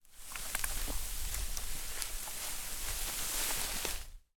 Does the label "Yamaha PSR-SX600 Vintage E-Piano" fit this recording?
No